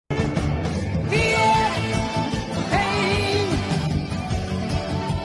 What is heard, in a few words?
fear pain